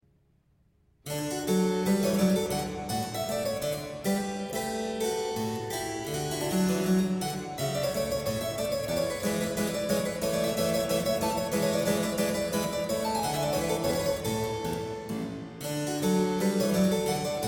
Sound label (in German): marche es dur; bwv anh 127; clavier buechlein fuer anna magdalena bach 1725